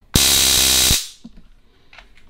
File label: stun gun sound real intense